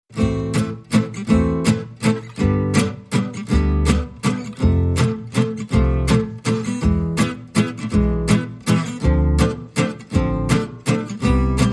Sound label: Bal Musette Waltz, No Drums No Strings